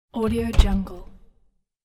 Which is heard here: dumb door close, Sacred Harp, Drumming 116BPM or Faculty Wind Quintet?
dumb door close